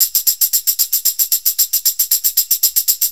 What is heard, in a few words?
6-8 Tamb, Perc Loops; Drum Tools